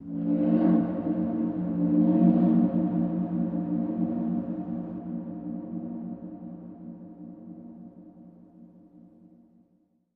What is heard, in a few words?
Horn Train Fog 2 Ambi